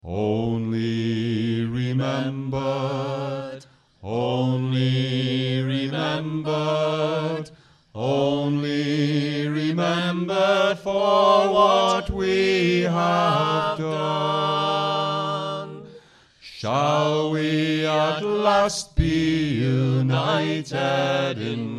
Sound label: folk club; ashington folk club